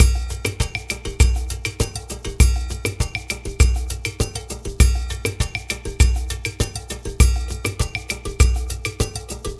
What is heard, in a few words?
Cave Ceremony, percussion; Sonic Foundry (Sony Creative Software); Joe Vitale Latin Percussion